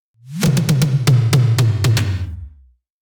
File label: tom fill; WA Glow